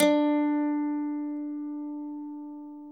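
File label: mandolin D3; Sonic Reality; American Heartland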